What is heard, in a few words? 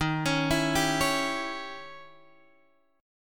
D sharp 7th Flat 9th, Guitar, Open G